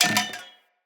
Hunter Bow Drop; Weapons; Enemy; Bows

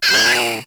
Kavat Vocal Pain; Warframe; Khora

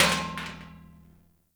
Metal Trash Can Impact

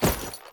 Temple Generic Footstep; Warframe; Foley

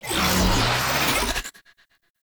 Hack Ability Cast; Warframe; Warframes; Helminth Abilities

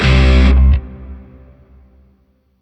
Shawzin Yo Chord I; Music; Grineer Shawzin; Warframe

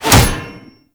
Shield And Sword Catch; Mortifor

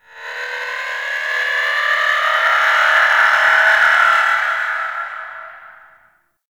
Void Ghost Capture Started; Void Tower

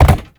Land Glass, Movement; Warframe; Uriel

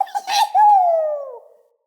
Trapped Sprout Falling Vocal; Friendly; Sproutfolk; Fey Hub Sprout

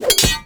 Grapple Impact; Valkyr Rework; Warframe; Berserker

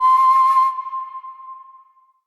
Flute Alt C; Player Instrument

